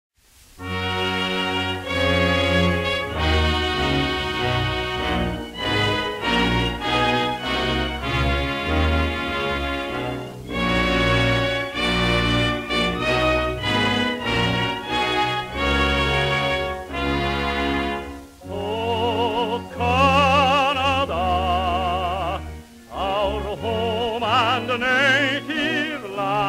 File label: o canada english version